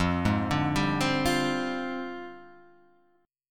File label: F Minor 6th Add 9th F down Guitar Half Step Down